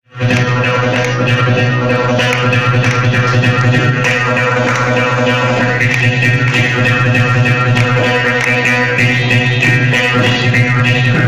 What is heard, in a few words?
Morsingh